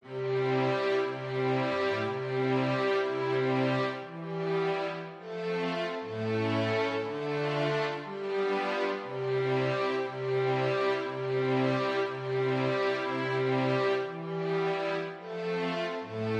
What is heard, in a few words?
building up string arrangement